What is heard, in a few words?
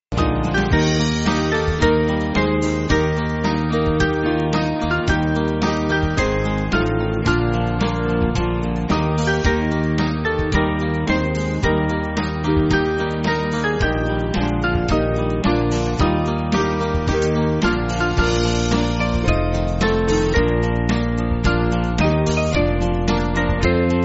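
Music Backing Files for Churches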